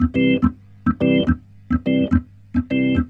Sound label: Roots Reggae, B-3; Sounds Good - Roots Reggae